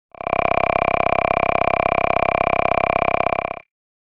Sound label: Alien croaking sound.; Aliens